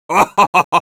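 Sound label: laugh happy, Spanish; Risa feliz 1; Scout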